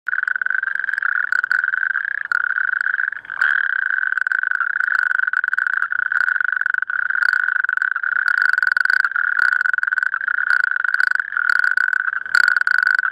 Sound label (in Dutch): rugstreeppad geluid